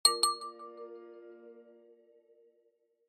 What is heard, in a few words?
NOKIA ALERT Message; Lumia